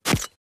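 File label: Flashbulb Pops With Glass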